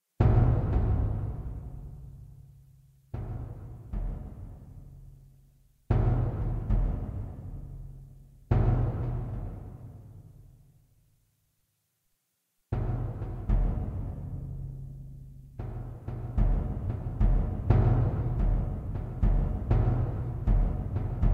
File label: Ambient